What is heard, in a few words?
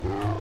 hitsound